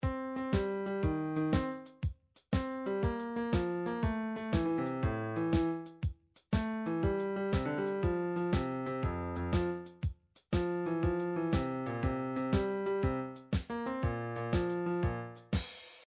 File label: reggae